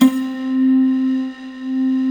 Glass Tamb; Best Service Gigapack 1

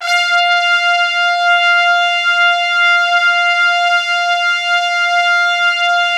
Orchestral Brass; Best Service ProSamples vol.20; AKAI

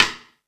Percussion FX, Drum Machines

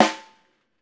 STR. SNAR; Drums&Percussion; E-MU Producer Series Vol. 7 – Old World Instruments

